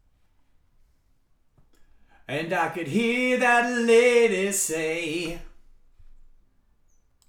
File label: vocals